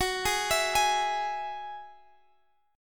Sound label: Gb 7th Suspended 2nd Gb down Guitar Standard; arpeggiated; capo 2